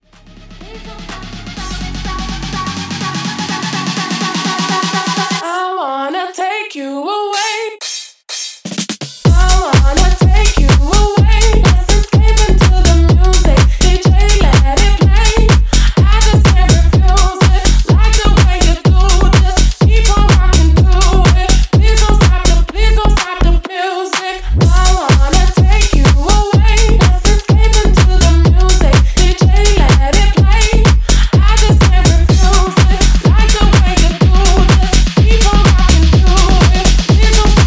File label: Afro House Intro